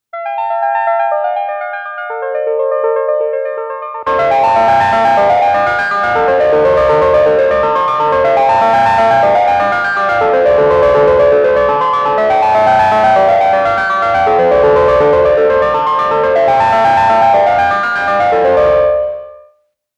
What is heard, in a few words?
synth